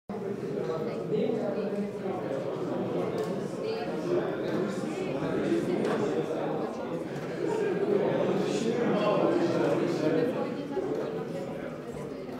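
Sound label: Crowd - Talking People Noise Ambience Chatter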